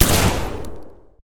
toz194 fire; STALKER

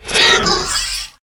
chimera make hit; mutants; STALKER; customnpcs

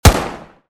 rpk74m fire; STALKER

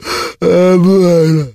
mzombie take damage; STALKER; customnpcs

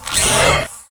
chimera take hit; mutants; STALKER; customnpcs

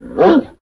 dog idle; STALKER

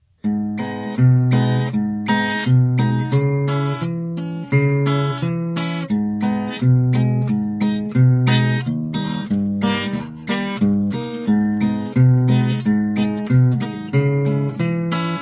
guitar; STALKER

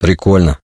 reaction music; STALKER

